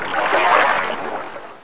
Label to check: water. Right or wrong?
Right